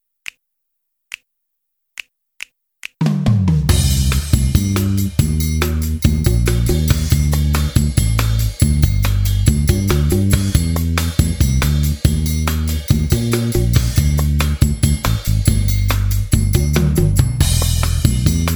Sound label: minus piano C mayor